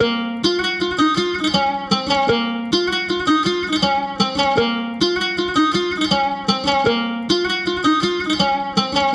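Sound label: A MIN OUD, Organic House / Deep House Sample Pack Oriental & Middle Eastern; Azura – Organic House Sample Pack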